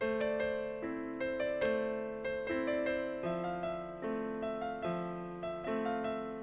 Piano Ready For Loop

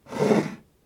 Glass Slide

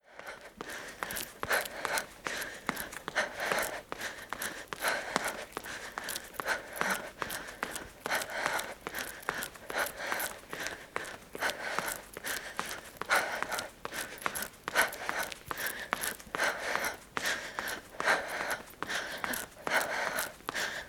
Breathe Running Girl

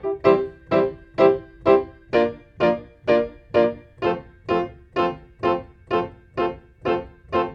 F Piano

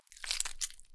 Squelch

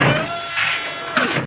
Scifi Closing Door

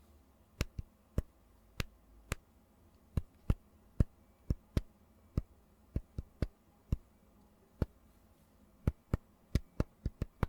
Finger Tapping Phone

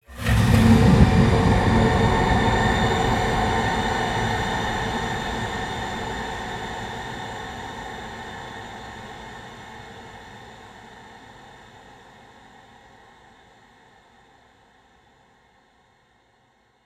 Deep Metal Impact Airy Angellic Chorus

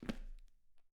Step on wood

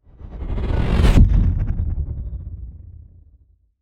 Cinematic Woosh

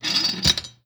Industrial Clank Sound On The Uss North Carolina

